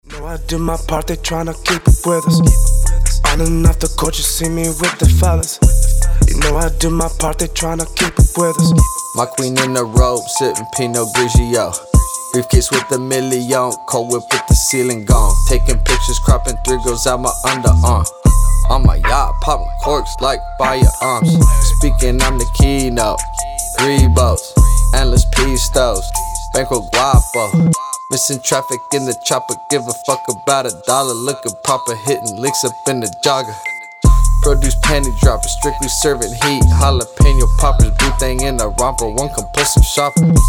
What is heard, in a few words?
HIP HOP